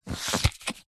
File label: board game game card remove slide out from pile stack; telstrations